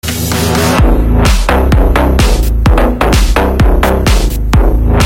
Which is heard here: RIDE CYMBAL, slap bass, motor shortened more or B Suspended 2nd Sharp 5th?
slap bass